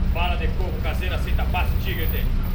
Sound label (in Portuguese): No Onibus, Fieldrecordings, Brasilien